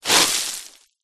stones granite gravel and dust kick foot disperse roll